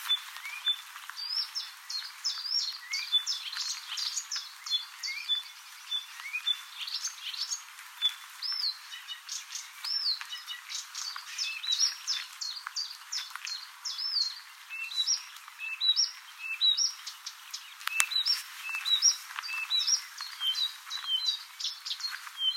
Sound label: Blyths Reed Warbler